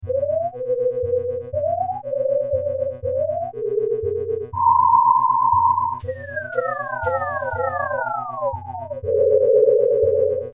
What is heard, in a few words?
noise Loop